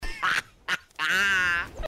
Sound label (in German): dreckiges lachen